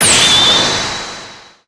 shout

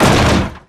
fall; ahlbi

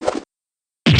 shank

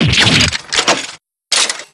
damage

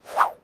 throw

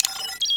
hacking start; bobby

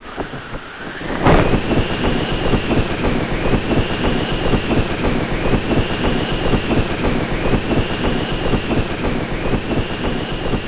damage

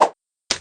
nervous

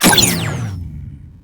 turned off

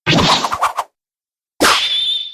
cap fly

